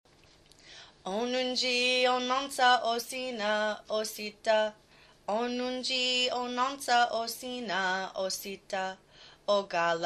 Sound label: Body Parts Song